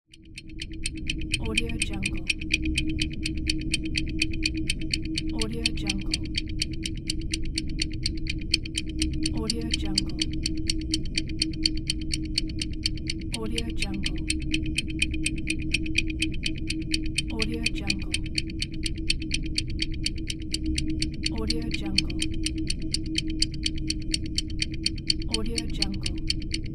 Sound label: space timer ticking